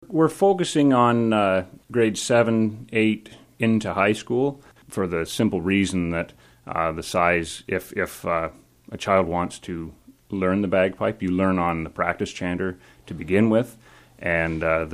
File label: bagpipes